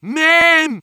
class menu, music

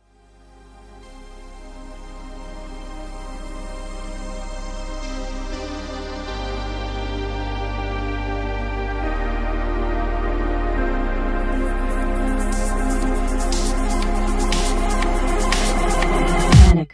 buildup; TF2Maps; chillskies; wubwubwub

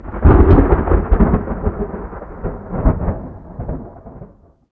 thunder; minecraft; ambient; weather

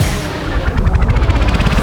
fill, music; brawler

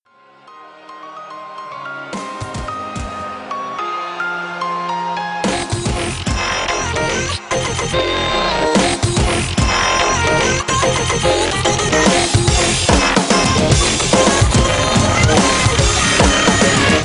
buildup, wub wub wub; chillskies

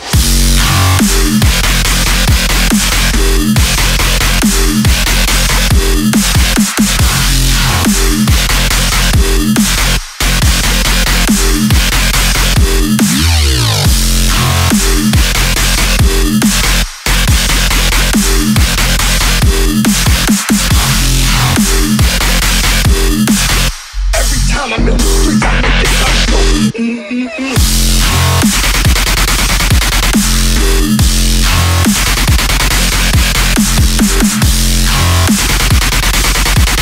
Drop, wub wub wub; TF2Maps

